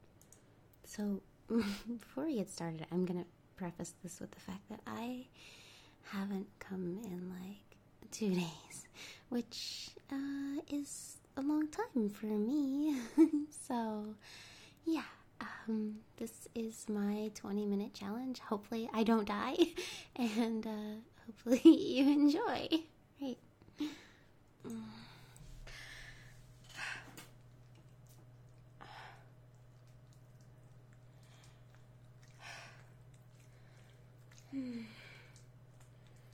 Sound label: All the Wet Noise; [F4A] 20 Minute Challenge; [53 Orgasms]; [Real]; [Glass Toy]; [I made a huge mess]